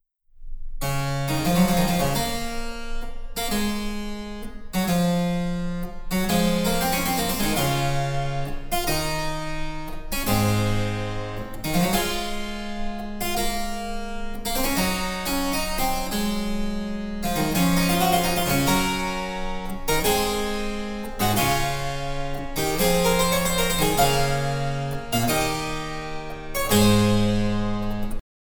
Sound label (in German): Cembalo; Mark Lindley WTC 1